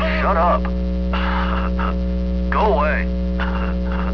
windmill; duckhunt

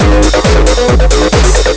beat

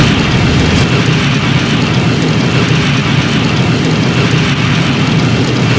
stargate active

